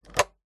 household telephone landline receiver pick up

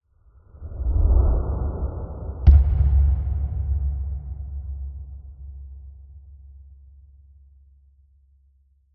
impact whoosh slow motion item drop

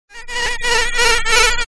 science fiction robotic fly bee fly close; zapsplat

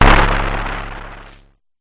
Synth Dirty Distorted Blast Oneshot; sound spark

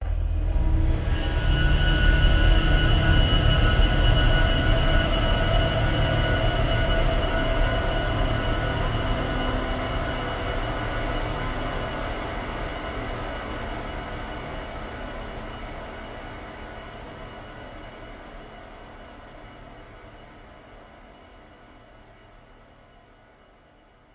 horror hit long dramatic scary airy metal scrape

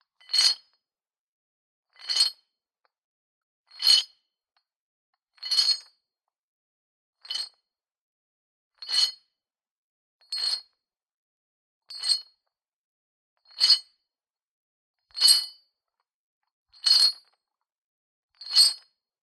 Chains Footsteps; Just Chains; pitched

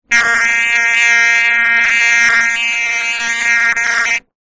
cartoon fly buzz; zapsplat

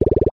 cartoon pops fast multiple pop out

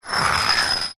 brick scrape on smooth concrete; foley

